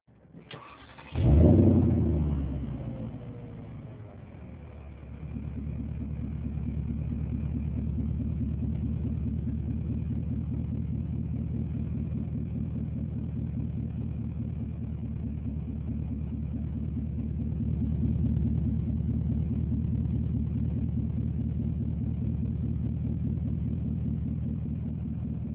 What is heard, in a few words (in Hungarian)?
indítás alapjárat, porsche cayenne